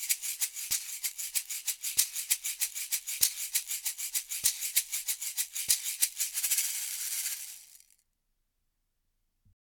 shaker beyerdynamic m160

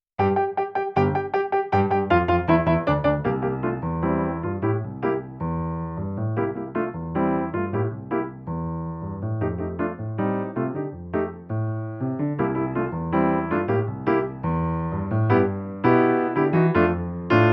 nord piano 4 amber upright

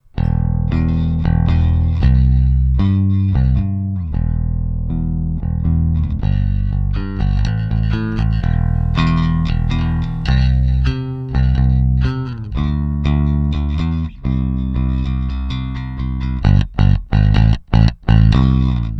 equalizer sweep treble; Trace Elliot ELF

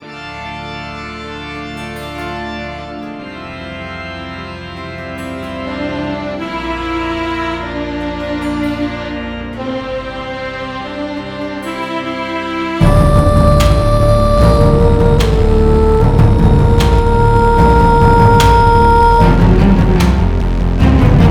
midi guitar instruments; eastwest midi guitar instruments test

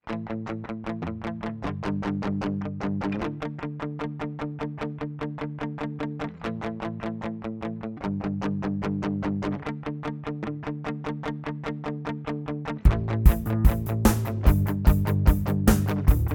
rock; digitech trio

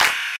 clap

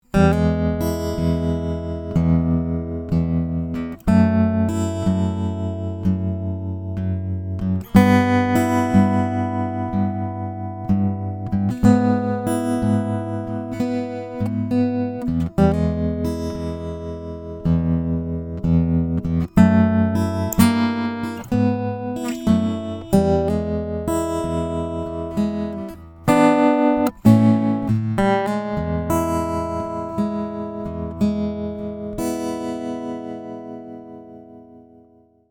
Zoom A3 tremolo

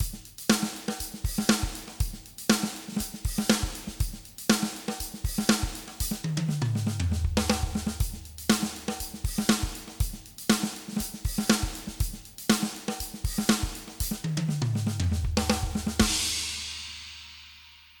drums 10khz 6db; softube abbey road studios brilliance pack; rs127 rack

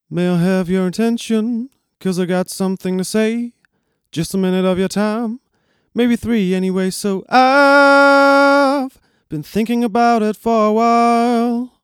song snippet; akg d7

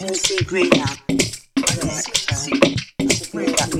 toploop 126; Wave Alchemy Loop Tools and Grooves

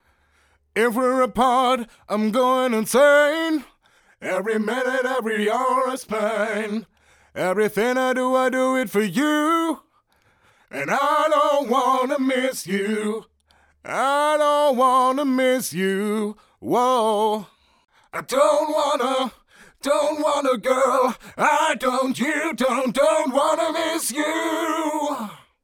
vibrato; modulationseffekte zur stereoverbreiterung

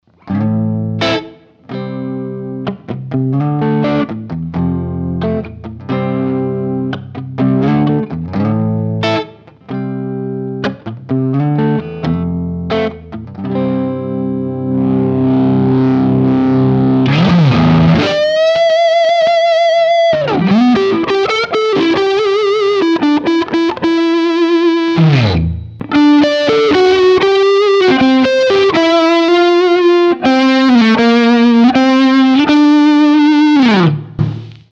gibson les paul lpm neck clean to gain

